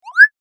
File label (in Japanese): 短い音-ズッコケ